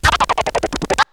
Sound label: FX; AMG Megabass REMIX! VOL-2